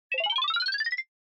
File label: Motion Slide Mid; スライド10-2(中)